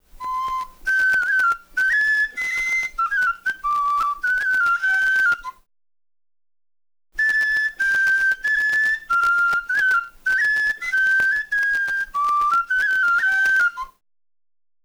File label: flute; mountainpatterns